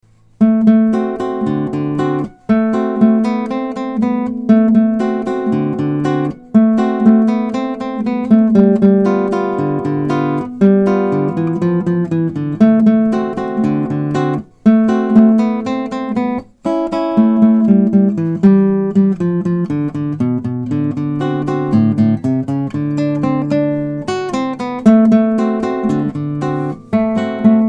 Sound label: Rock n Roll Guitar